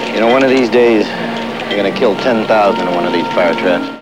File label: firetraps